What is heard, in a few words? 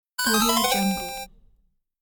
level start or game over